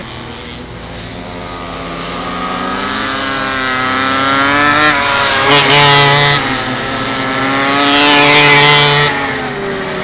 motorbike race, street traffic; best service Blue Box

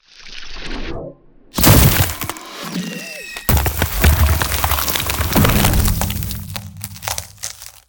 Ice Spell Ultimate; Ultimate Ice Spells; Frozen Eternity; Arctic Aura

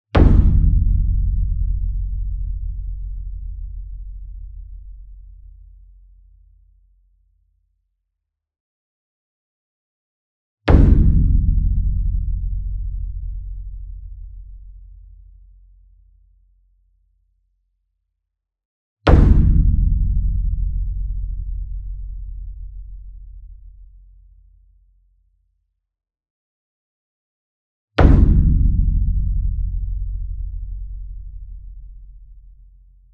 SINGLE DRUM Gong Hit Soft Beater Near; boom library; Cinematic Strikes Construction Kit

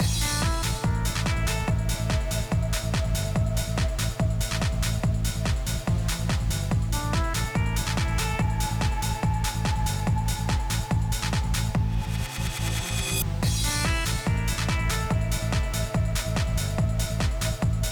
goa trance loop; moodsounds; Audio Game maker Sound Library

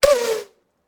Bullet Pass By; Sound Ideas; Guns

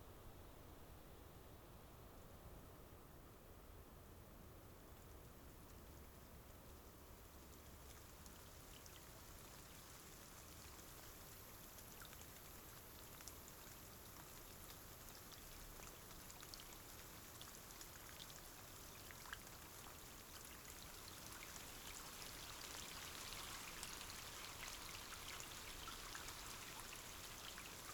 Rain light shower on water; boom library; Thunder And Rain

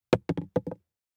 DICE on Glass, Throw and Roll, Foam, 1 One Die; Samples; ArticulatedSounds; DICE Sfx Party (Extended)

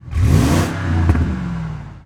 Truck Expedition Rev; Trucks

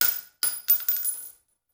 Bullet Shell; Sound Ideas; Guns

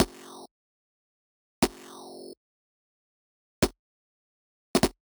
Generic Click Digital

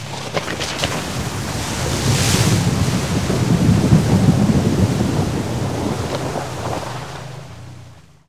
Auto Tires Splash Water; Automobiles

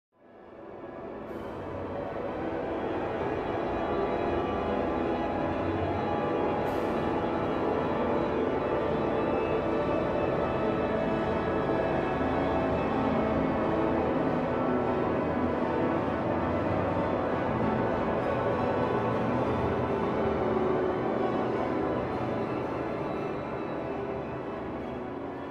Symphonic Cacophony; Hollywood Edge; sound designer's toolkit v2

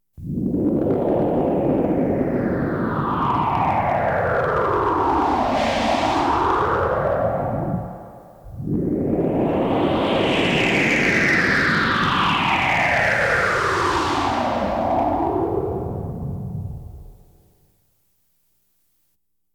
Science Fiction effects, Lasers, Light beams

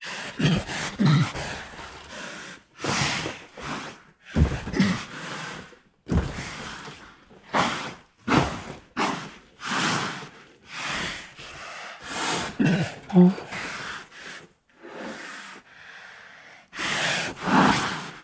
Siberian Tiger Anxiously Huffs, Puffs and Snorts; Tigers; Sound Ideas

